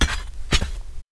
walk; BNight

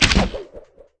Slow Shot arrow run

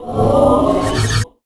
Blur

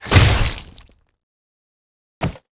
King Spider die; Seer; GamigoNA

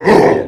Smart Yeti Damage

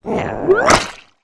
King Slime Skill; Seer; GamigoNA

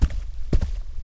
Flame walk

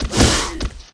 shadow dragon walk

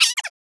Slime die

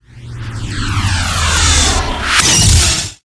Drain SP; Cracker Humar; Gamigo

